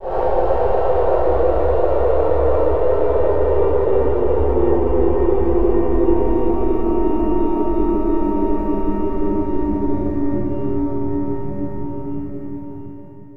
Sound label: gliss